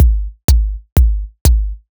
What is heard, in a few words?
Strip 124bpm, House Drumloops